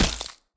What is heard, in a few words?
fall damage